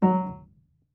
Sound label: marimba piano; ゆめ2っき